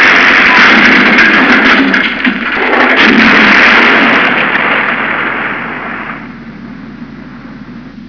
araba kaza; tasitlar

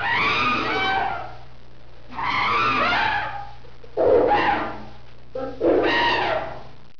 maymun feryad; hayvanlar

